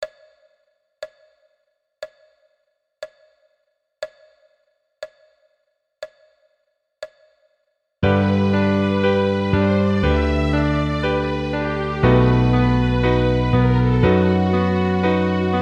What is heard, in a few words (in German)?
uebung piano bass violins